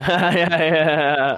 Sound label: Laugh